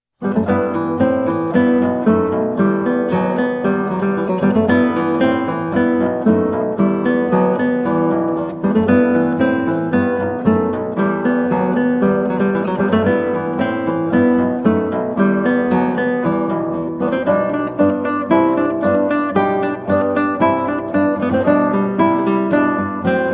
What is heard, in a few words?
Presto, guitar, spoken; Partita 5 in E Minor TWV41 E1; Georg Philip Telemann